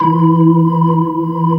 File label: E3, Keyboards Old School; USB Soundscan vol.09; AKAI; BIG HAMM